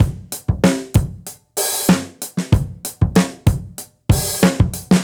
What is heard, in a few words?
Beat 95, dusty funk; musicradar; Beats; 95bpm